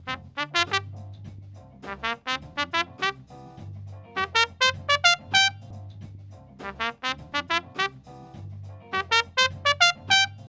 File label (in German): Kompressor Vergleich SSL Native Comp V6